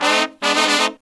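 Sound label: Pop & Funk Brass, 110 F, right; Best Service ProSamples vol.25; AKAI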